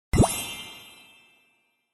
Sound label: heart levelup